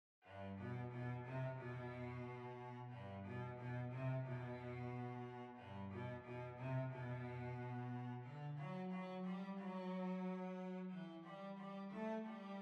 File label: Violoncello; Bloomsbury Market